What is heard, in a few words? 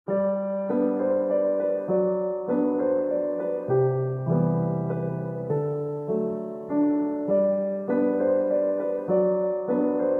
Lovely Piano Song